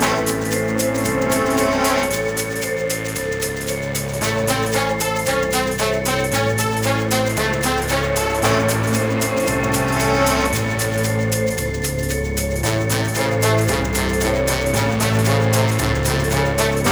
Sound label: Music Action Short; the unholy society